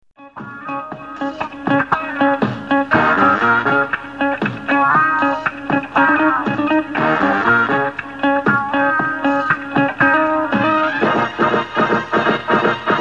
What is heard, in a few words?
Opening Theme; Mid-Atlantic